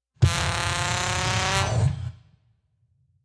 Brass Fart

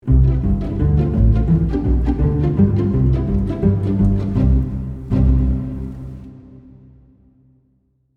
Pizzicato Bumper

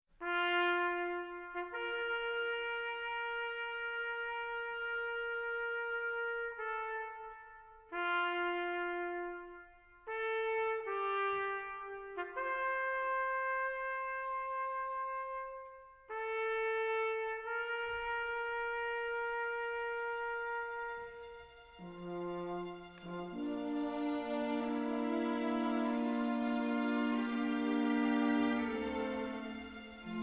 Epic Build

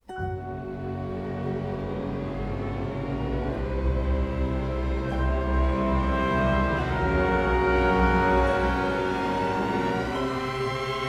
Ascending

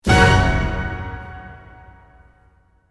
Major Hit

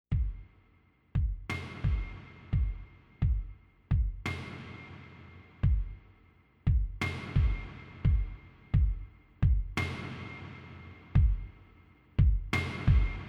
Somber Sparse Soundscape

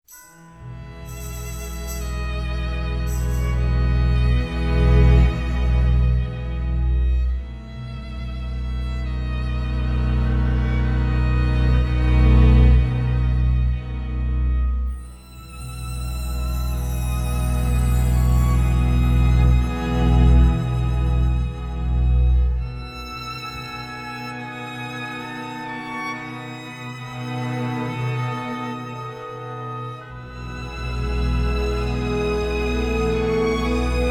Lush Strings

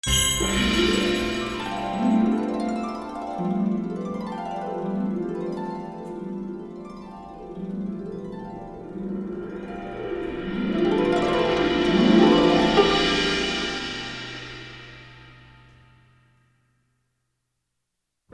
Harp Dream

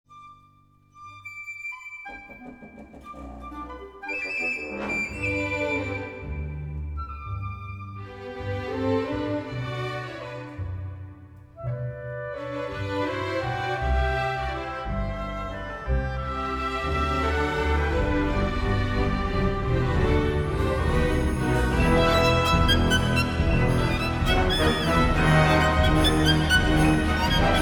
Orchestra Rehearsal Wipeout

